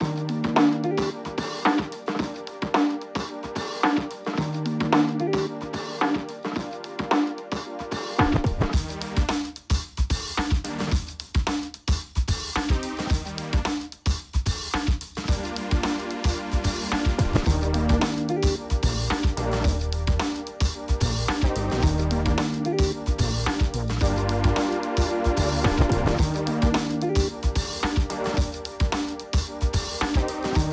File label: Hip Hop BPM110